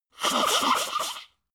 nose blow wiggly; soundpool

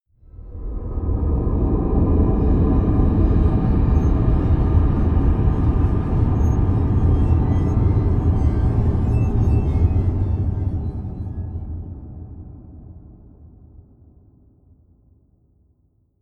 whoosh squeaking gates; swooshes-swishes

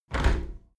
window handle squeak turn close halfway heavy; objekte; fenster

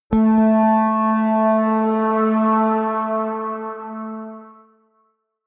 synth bounce high, drones

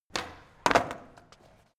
skateboard indoors kick

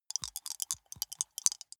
small two rattle in hand closed, wuerfel

